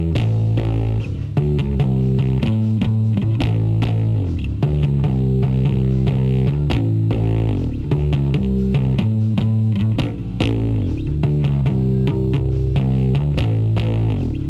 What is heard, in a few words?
bass